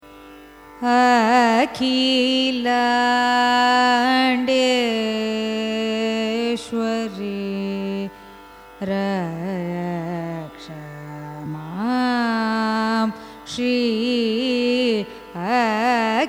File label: raga dwijavanti carnatic; akhilandeswari